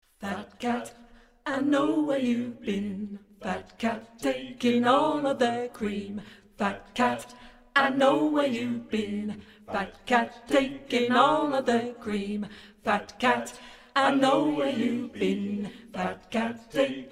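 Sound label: tenor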